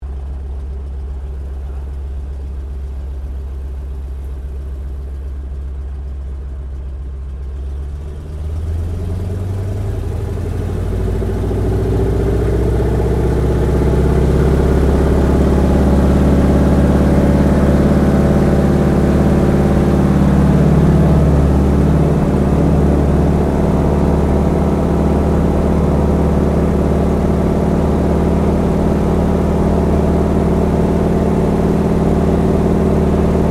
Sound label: AIRCRAFT BOEING PT-17 STEARMAN TAKEOFF INTERIOR, Trainer; 1939; Airplanes; Vehicles